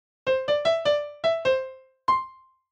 dry, Piano

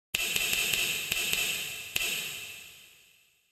freeverb, Snap, reverb

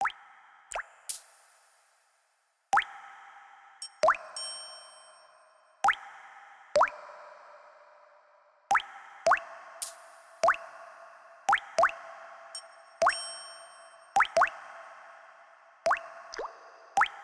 reverb water triangle test, smith

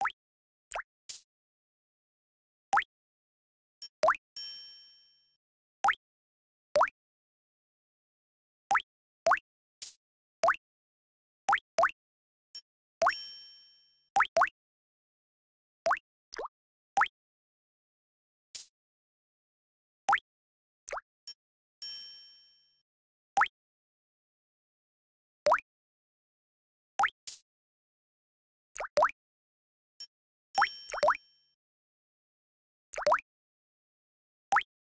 water triangle test dry, Water drops + Triangle

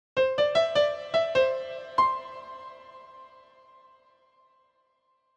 lexverb, Piano, reverb

